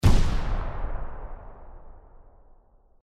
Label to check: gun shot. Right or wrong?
Right